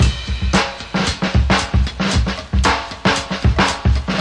114 bpm, rambling wide funk, drum loops and breaks